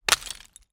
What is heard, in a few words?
impact rock stone hit small rubble